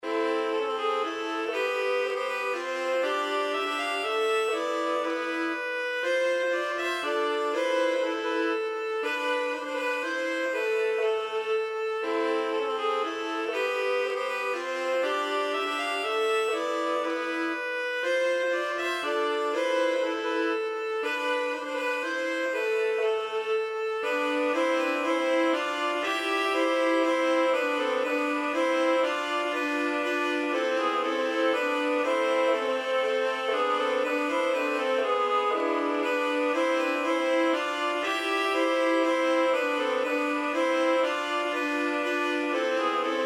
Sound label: folkish